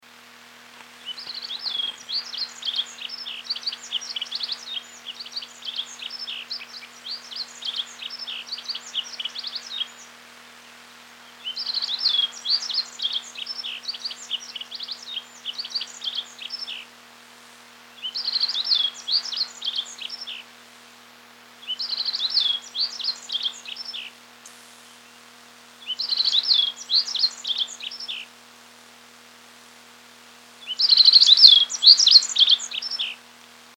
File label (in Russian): geltogorlaya, birdsong